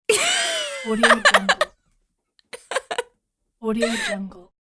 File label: female teen short laugh; human sounds